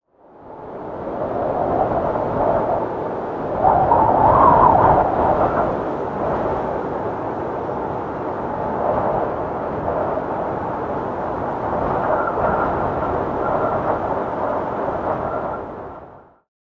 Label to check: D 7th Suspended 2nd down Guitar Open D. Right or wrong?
Wrong